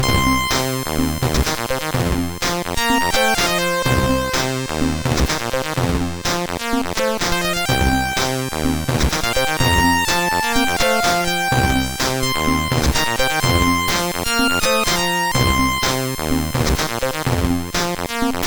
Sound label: sid MOS 6581R2; Soul